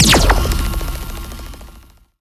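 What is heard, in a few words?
Sci weapon Shot Beam Medium Metal Melter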